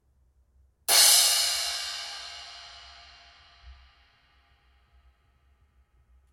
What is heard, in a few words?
Zildjian EFX14